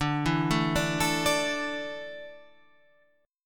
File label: D Suspended 2nd D, down, Guitar Half Step Down